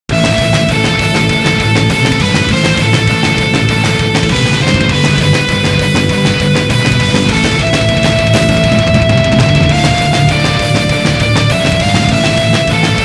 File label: metal